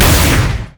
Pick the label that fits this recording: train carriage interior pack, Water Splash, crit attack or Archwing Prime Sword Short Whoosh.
crit attack